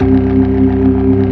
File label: ORGAN ALL STOPS; Keith Emerson Signature Series